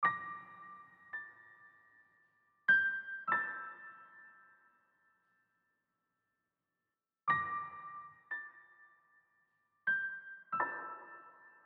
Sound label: Piano Variant; the mortuary assistant; The Mortician